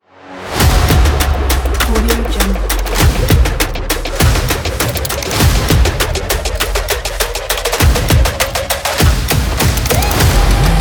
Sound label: percussion trailer trap ident